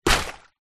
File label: plastic bag full of water set down hard on wood surface; foley